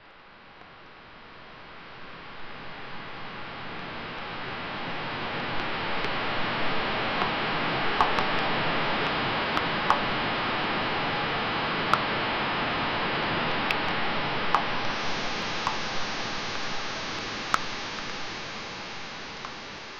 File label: super slow motion rain